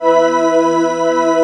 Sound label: Soundtrak loop; miniBAE source code audio